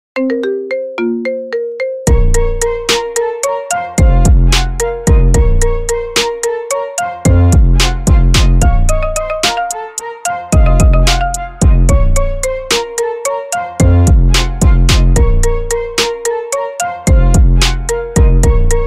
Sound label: marimba remix